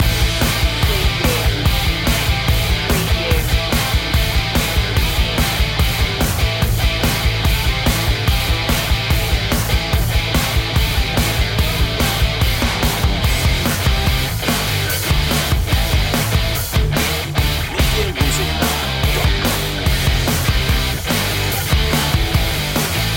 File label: pedal to the metal loop